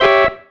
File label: Stab, Organ, One Shots